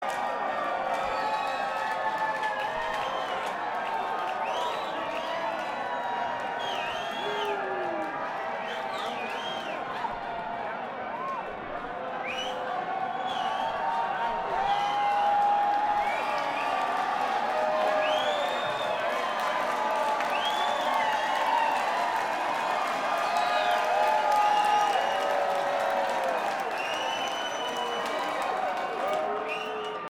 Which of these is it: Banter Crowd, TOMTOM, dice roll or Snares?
Banter Crowd